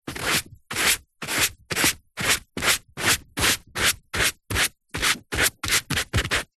office paint brush artists brush strokes on paper various